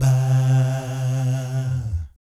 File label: VIB FX, Jazz FX; Spectrasonics; Vocal Planet; JAZZCOMBO